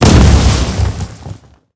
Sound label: f1 explosion, weapons